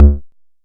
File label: analog tonal, kick; Ableton Foundation FreePacks